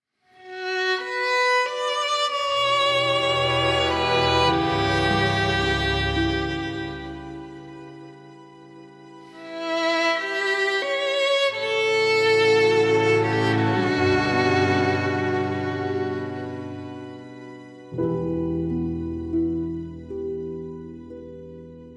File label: Violin Viola Theme